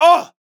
MALE VOICE DEFENSE SHOUT - Defensive Block or Damaged Hurt Submissive Short Interjection; Yell